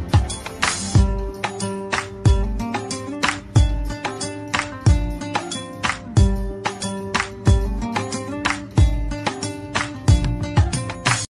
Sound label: INSTRUMENTAL RINGTONE; KANNADA